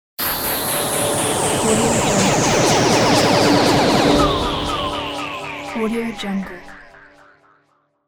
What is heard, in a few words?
retro sci fi